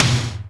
TOM, Drum Dry; East Collexion; Drum 1

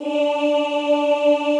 OOH D3, Classical Choir; Best Service; Extended Classical Choir

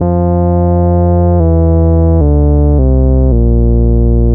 Moog, Trip-Hop Loops; Sonic Foundry (Sony Creative Software) - Rondo Brothers Trip-Hop Loops & Samples; Apologists

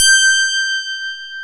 TINE PIANO; E-MU Formula 4000 Series Vol. 3; Analog Odyssey

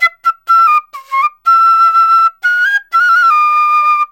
SERULING, Heart of Asia

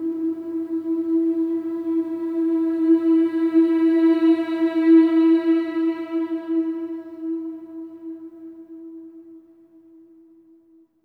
MM E4; SWELL MMS

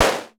snare mod

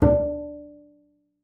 D4, bass pizz; Xsample VOL-5

